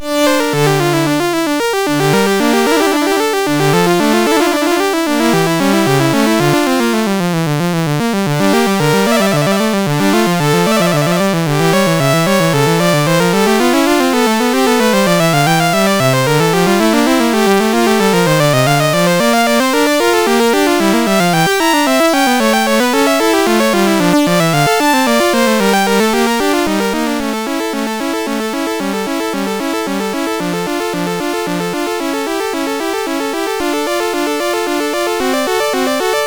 Prelude No14, MOS6581R4

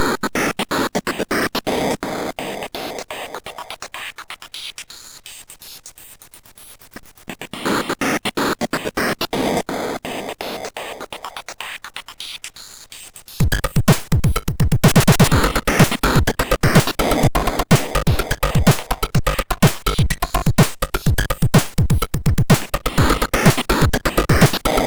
Drums, MOS6581; MOS6581R4

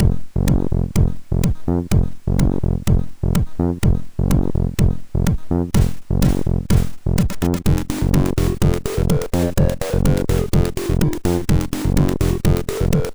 Brutal Techno MOS6581R2; sid

